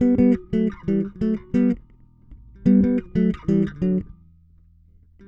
Funk, Bass; Sonic Foundry; Bass X

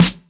Snare, Snaredrum; Equipped Music - Loungin House

